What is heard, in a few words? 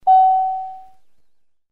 Car Seat Belt Tone